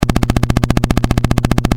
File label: helicopter; supercobra